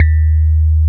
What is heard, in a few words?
TINE; Wizoo - Powered DX; DIGIJAZZ